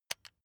electric button drill direction switch backward heavy